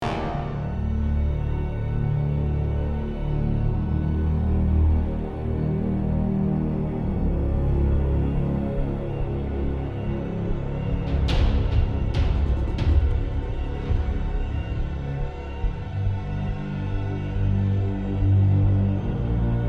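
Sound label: Music Agitato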